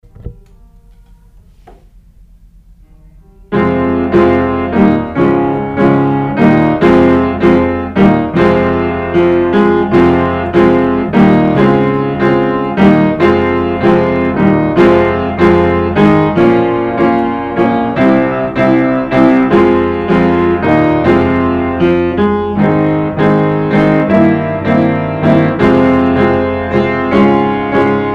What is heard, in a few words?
piano